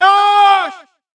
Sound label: yells